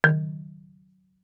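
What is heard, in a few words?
Marimba deadstroke fortissimo Eb4; MIS Pitches - 2014; Percussion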